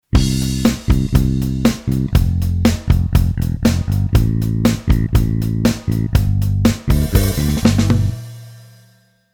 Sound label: MIDI Track Delay Push